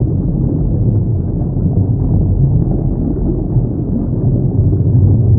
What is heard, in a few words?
Maelstrom Ocean; ambience